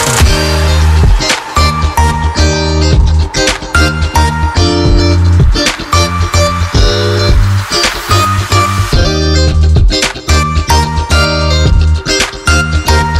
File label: Lg Song Ringtone